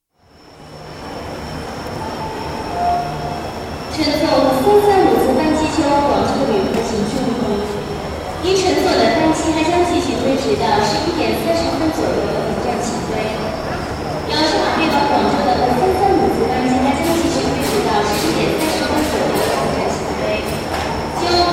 Shanghai: Airport atmosphere, with announcements; Airport Sound Effects; BBC

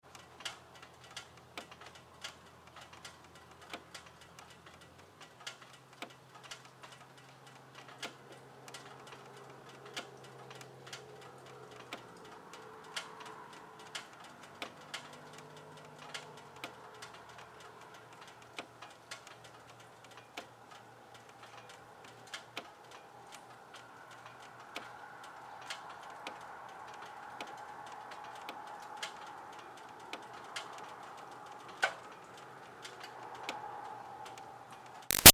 Car Highlander Hot Ticks; 2005 Toyota Highlander V6; Cars; Vehicles; Big Room Sound

